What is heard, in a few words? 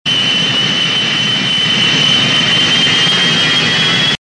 plane engine screaming